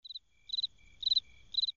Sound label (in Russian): sverchok; Сверчок; teatralnye shumy